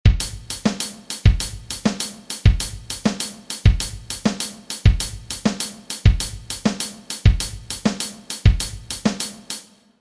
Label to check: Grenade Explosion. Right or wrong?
Wrong